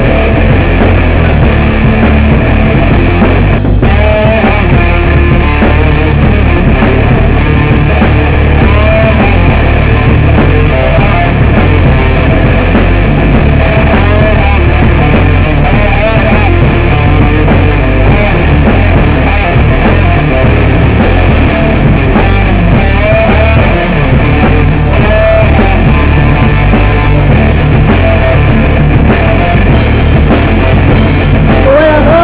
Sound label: guitar